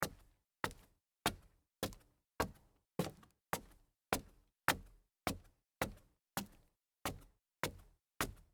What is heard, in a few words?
dress shoes on stairs wood